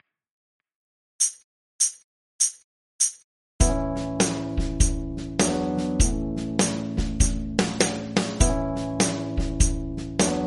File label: Three on A Ground Backing